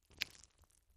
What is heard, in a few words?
GUT SQUISH, gore